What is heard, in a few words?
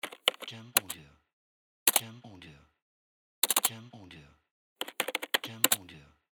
Buttons Short Sounds